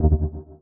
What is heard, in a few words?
Bass, One Shots; Touch Loops; BlissedOutBeats